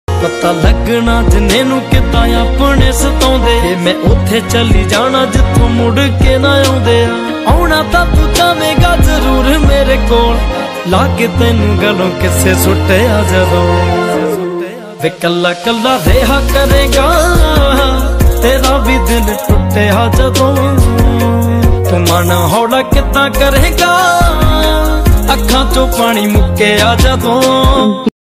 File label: sad song ringtone